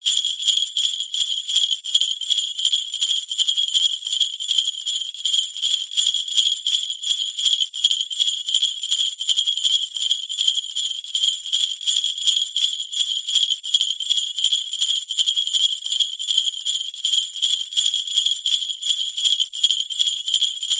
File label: musical large sleigh bells med shake